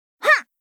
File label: VOX Get Hit; Li Li; Heroes of the Storm; warcraft